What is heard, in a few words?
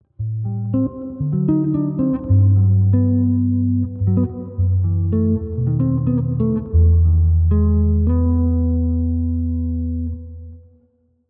Reverb USB